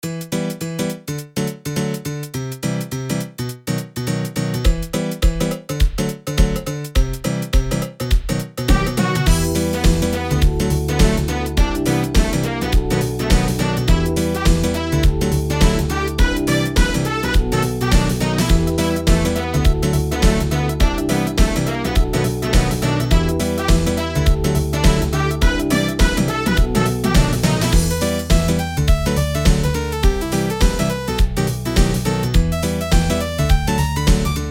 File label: korg m01 jam; weeklybeats-2014